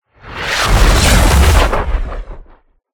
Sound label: Meteor Almost Landed